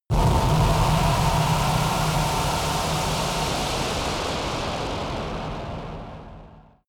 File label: massive screaming strike impact